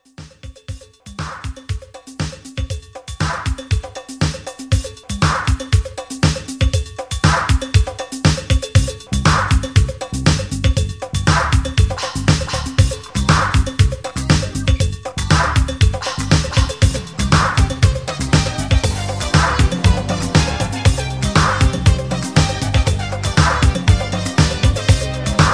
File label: 80s Conga Re Drum